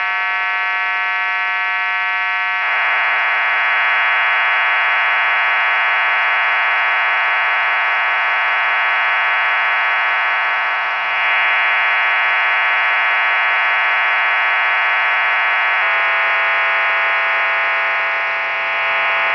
CIS 12-TONE MODEM AT-3004D / AT-3104 Type Modem / 12x120Bd; CIS 12-tone modem in stby and idle condition (BPSK modulated)